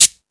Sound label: Timbale, E-MU Pro-Cussion